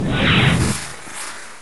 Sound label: evolve